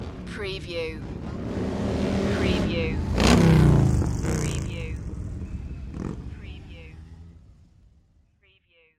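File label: Motorcycle Drive By Right Left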